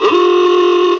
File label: horn; Mafie 1